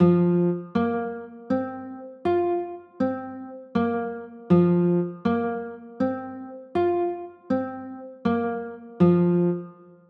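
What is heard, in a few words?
F sus2 chord, guitar; f sus4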